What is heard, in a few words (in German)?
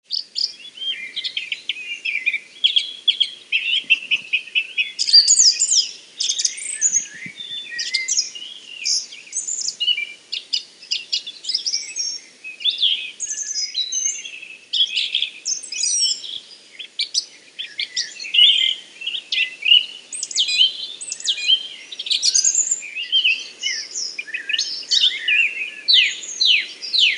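vögel neu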